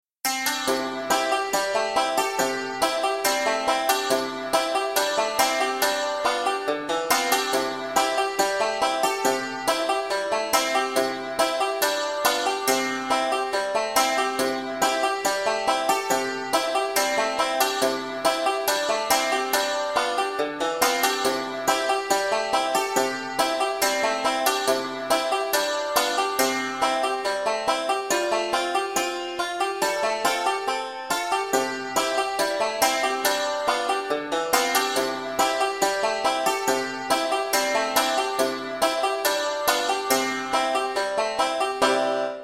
acc c tuning, banjo; beginning 5 string; accompaniment played with the melody

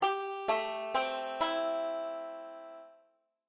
a minor 7, banjo; beginning 5 string

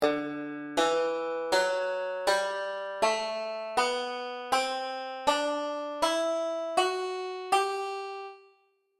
g scale root position, banjo; beginning 5 string

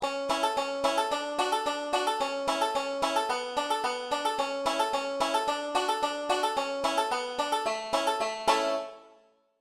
boil cabbage solo key of a, banjo; beginning 5 string

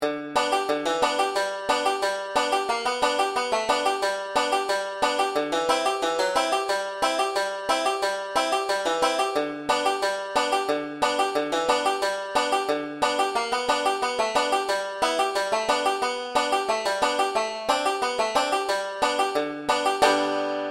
syncopated, banjo; 5 string; this lil light

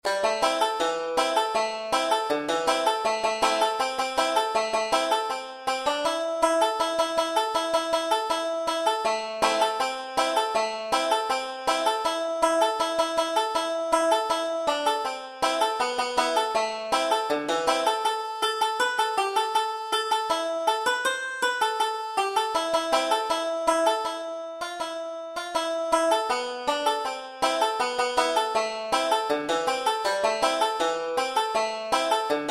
am solo, banjo; 5 string; tarriers

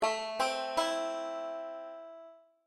am triad, banjo; beginning 5 string

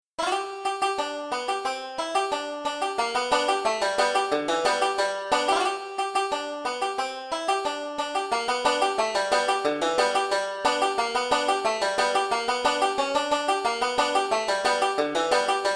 banjo solo; 5 string; cripple creek

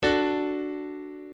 piano chord D; circle of fifths

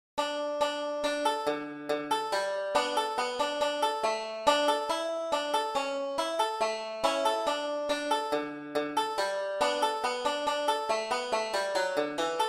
wreck of old solo, banjo; beginning 5 string

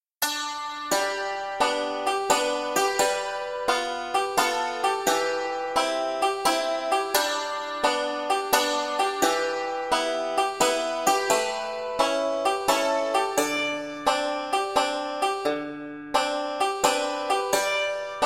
banjo 9 8 g c d em a7; 5 string; amazing grace

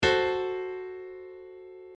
piano chord f sharp dim; circle of fifths

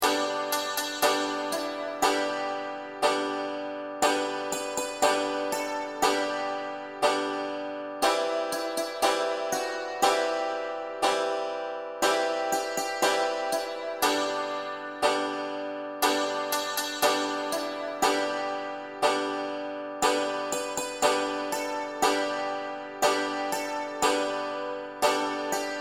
this lil light strummed, banjo; beginning 5 string

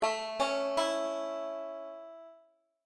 a major triad, banjo; beginning 5 string

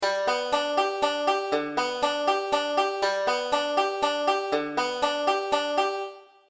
3 4 roll, banjo; beginning 5 string

